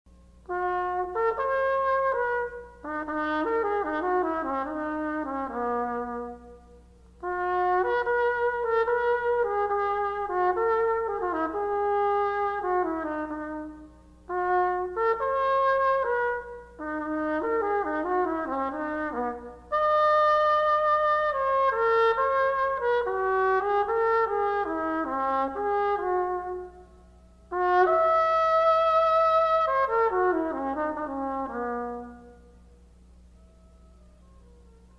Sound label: trumpet intro